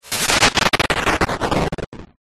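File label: multimedia retro game explode disintegrate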